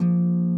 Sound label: harp